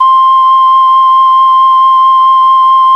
ocarina, pipe; GMPlay; GMTones

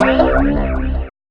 FX shot, Drum+Bass, 160; eJay

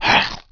bite small; vampire demo

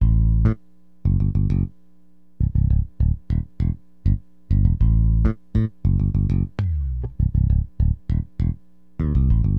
BASS, AcidJazz Funk; hiphop; LiveAct Pool 1

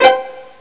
PLUCK; LOTUS

